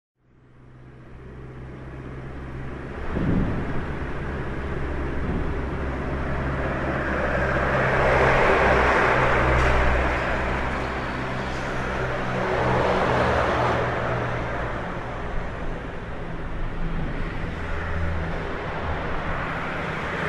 calles av rondeau y circunvalacion; Rosario

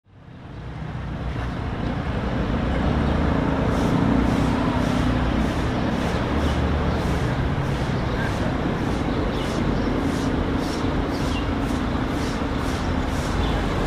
calles; zeballos y san nicolas

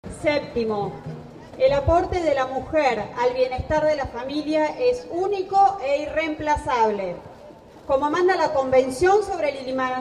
acto contra matrimonio gay; rosario p pringles 17 hs 09 jul 2010; red familia

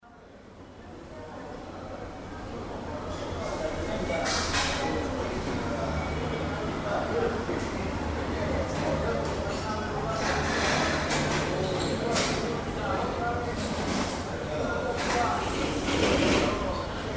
bares choperia; blanco hns; av pellegrini y alem; Rosario

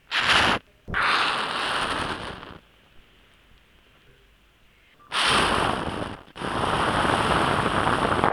toma mate

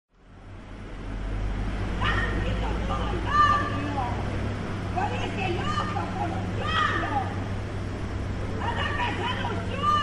calles mujer gritando; rosario; necochea y cochabamba

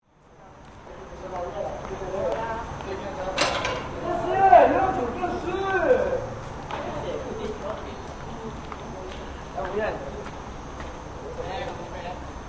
calles; el plumerillo y paso de uspallata

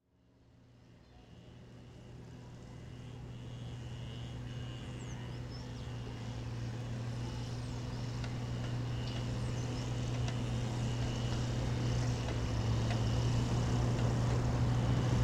parques tractor cortando pasto; parques sacalabrini ortiz; Rosario

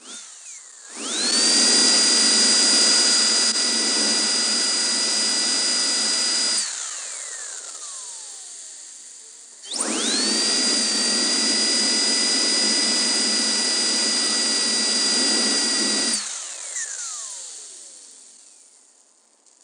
turbina dental; gacela; Oído Absoluto

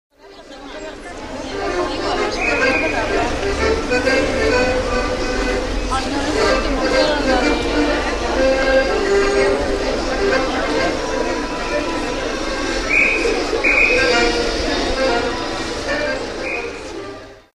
calles musico en la peatonal; cordoba y mitre

